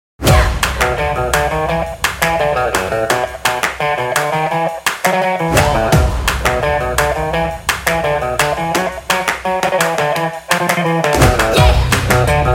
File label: Energetic Driving Surf Indie Rock